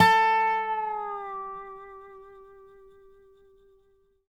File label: dobro; DOBRO DOWN; Country